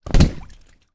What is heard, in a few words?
inflatable rubber ring land in water hard; zapsplat